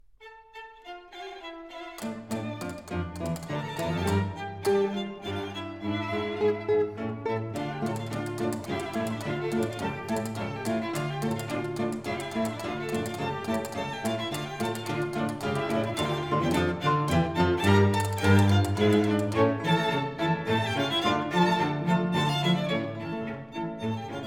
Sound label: Quintet D major Fandango; Quintet No4